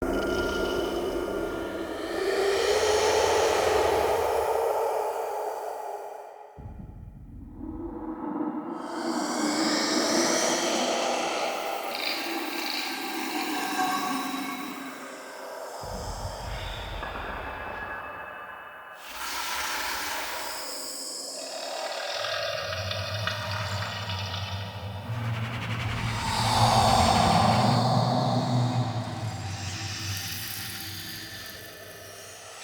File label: Crypt Bed Medium; Ambience; Champion Crypt